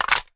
sg550 mag out empty

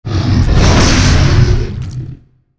idle, dragon

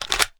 sg550 mag out

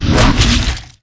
bite attack, dragon

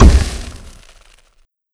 poison hit

